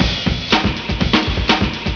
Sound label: souldrums